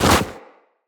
Run Deep Snow, Footsteps; Characters; Operator

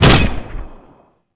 morph puncture; Warframe; Khora

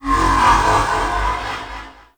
Corpus Ship Distant Energy Pulse, Ambience; Warframe; Corpus Ship Remaster

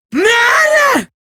death, female; Warframe; Eximus Grineer Female; Battle Barks

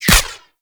Ghost Expel Spirit Impact; Warframe; PriestQuest; RellGhost

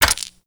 Dice Passive Slot Switch; Warframe; Koumei

